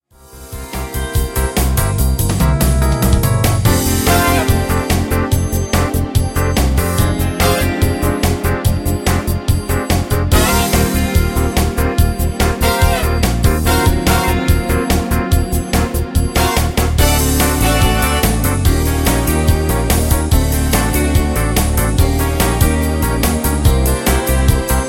ohne Backings

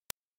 kein Playback, a cappella

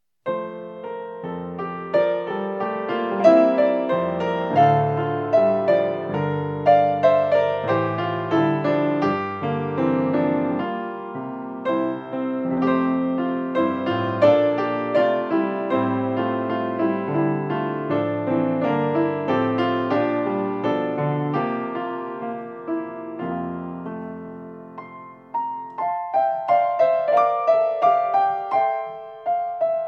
Nun danket alle Gott instrumental

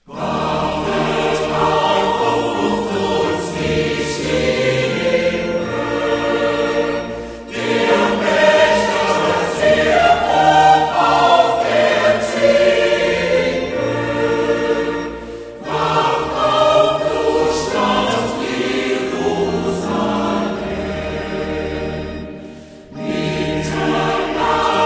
Chor

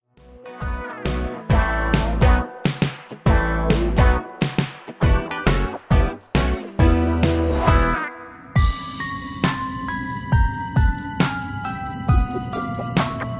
Rap Playback

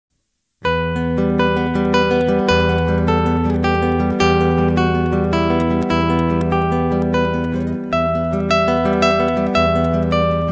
Romanze fuer Gitarre

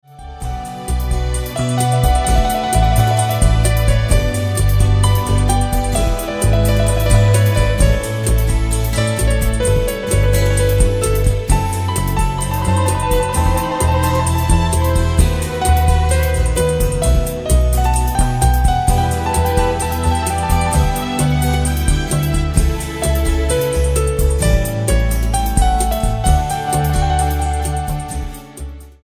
Air aus Suite in D-Dur

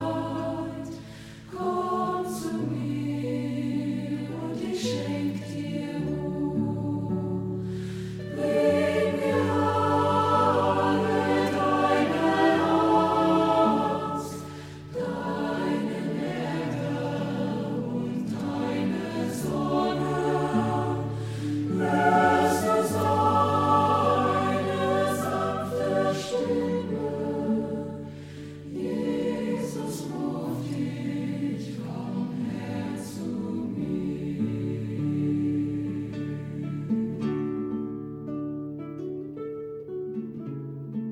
Sanfte Stimme

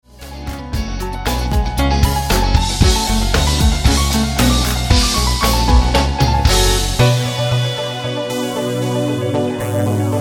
Badinerie aus der Suite h-Moll

